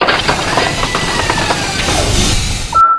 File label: reload; svencoop; frostline